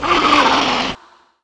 facehugger, IDLE; svencoop; aliens

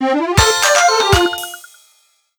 level up; svencoop; ttt

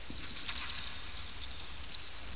rats

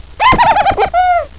hehehe

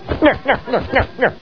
nyuk

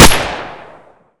pdw, weapons; brainbread

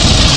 LOOP FIRE, smartgun; svencoop; aliens weapons

